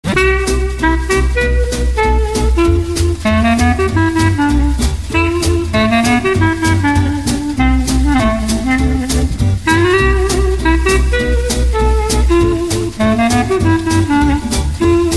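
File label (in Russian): datskij dzhaz, Danish jazz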